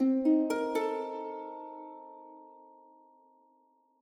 Military Exploration Harp; tintin reporter cigars of the pharaoh

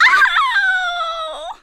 Female Death

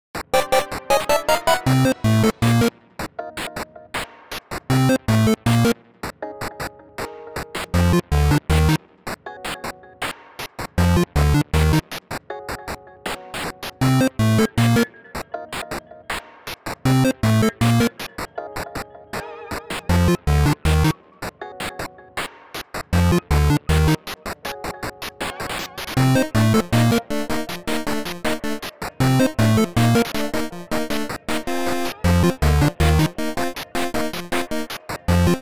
Underground Power Plant (8-Bit); Super Mario Odyssey

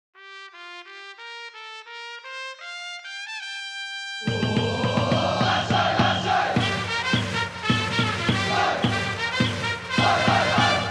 Music Ouen Event; eBaseball Professional Yakyuu Spirits 2021 Grand Slam

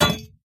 Chandelier Collision; Sfx - Chandelier Collision Var2

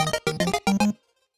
Turkey Hunt Success Sting

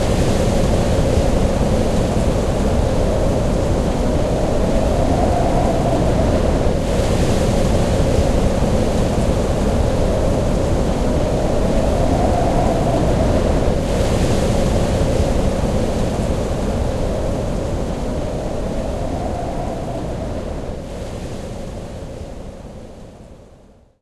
wind; jett rocket; wiiware wii

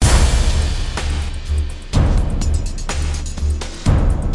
Medium Music; Alien Breed Trilogy; PS3; Xbox 360

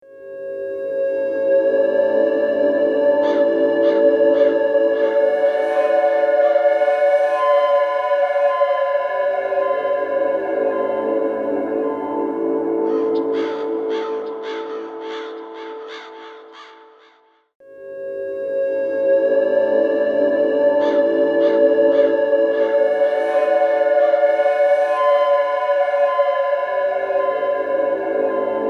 Outskirts District ~ Pre-Race; Full Auto 2: Battlelines

